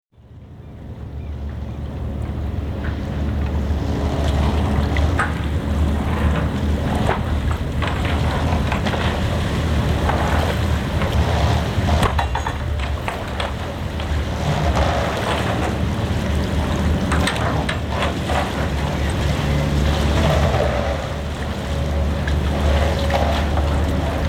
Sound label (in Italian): Fondamente Nuove; suoni